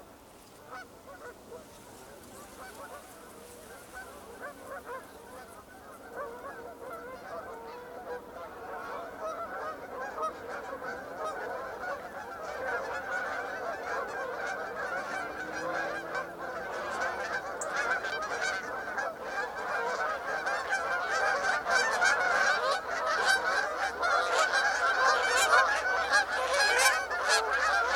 Canada geese overhead

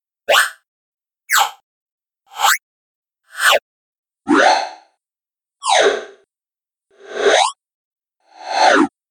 Seven Sweep Reverbed

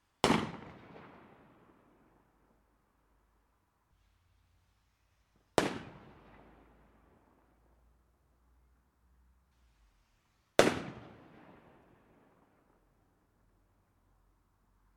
Three fireworks

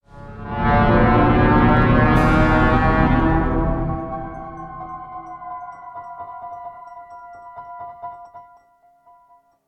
Thriller Scary Brass Depression

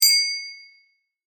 Handheld Bell

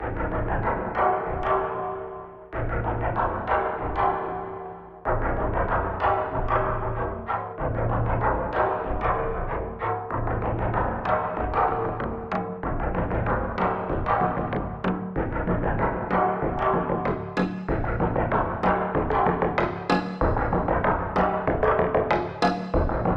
Scraping Beat

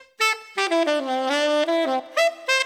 Cuckoo 4 Beat Dmaj 90

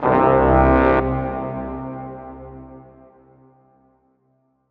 Trumpet hit

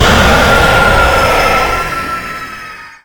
Combined Jumpscare

